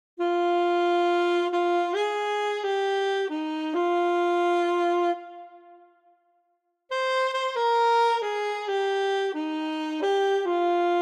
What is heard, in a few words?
Saxophone alto